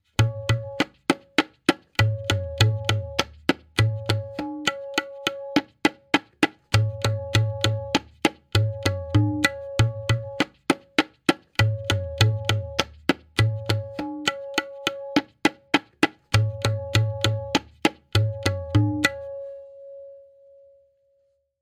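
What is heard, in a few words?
Variation 4-Played, Benares Kaida 1, Tintal; Benares Kayda 1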